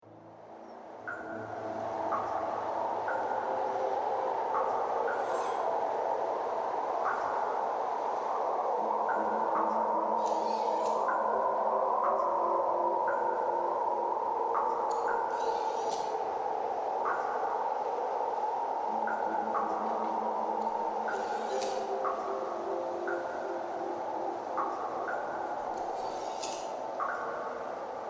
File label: dungeon